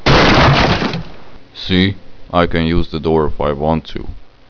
use door; svencoop

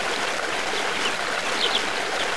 waters; firearms; jungle

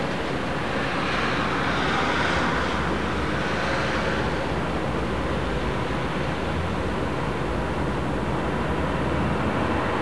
ambient city; chaoscity

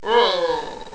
die; firearms

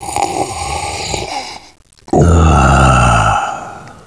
drink

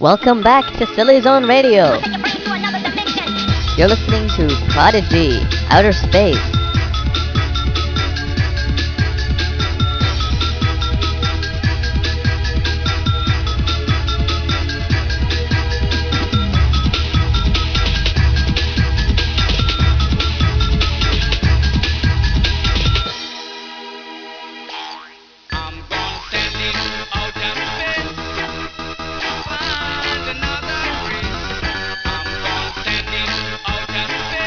loop

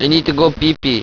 pee

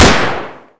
bizon fire, firearms weapons

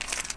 beretta mag out; firearms

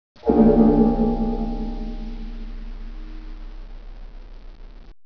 cloister bell, tardis

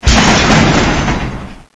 car accident; svencoop; saker

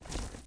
drone movement; svencoop; aliens drone

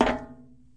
pl tin, player; cstrike

